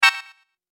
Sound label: error